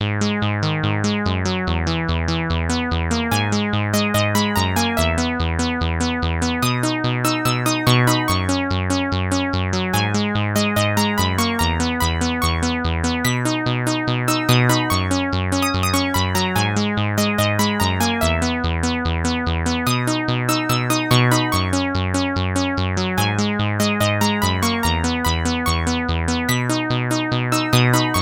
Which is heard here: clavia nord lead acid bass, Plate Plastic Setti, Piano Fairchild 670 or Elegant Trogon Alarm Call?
clavia nord lead acid bass